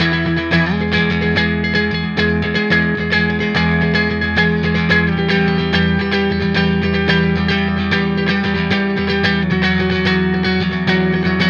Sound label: Moody Slide